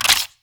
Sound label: atk bow